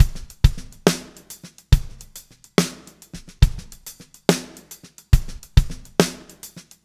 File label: LA SHUFF